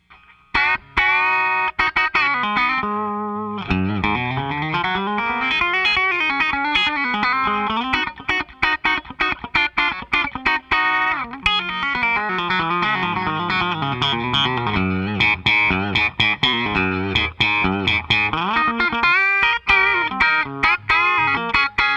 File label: chicken pickin; legend em12n; eminence